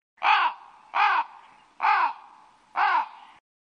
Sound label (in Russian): vorona zhaluetsja